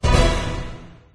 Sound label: right gate; There; ThereClient; gamekit; racekit